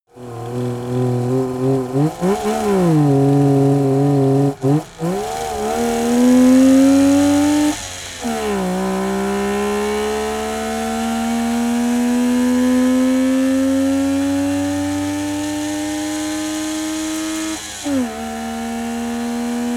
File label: car saab 92 accelerate heavy engine